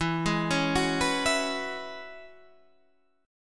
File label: E 6th Add 9th E down Guitar Half Step Down; E6add9